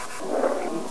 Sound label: Warning